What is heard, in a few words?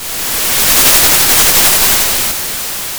Sound of a thunderstorm